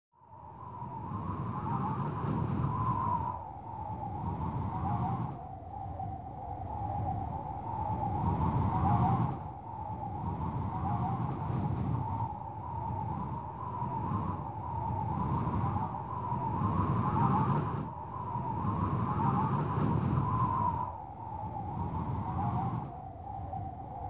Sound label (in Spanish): viento; hallow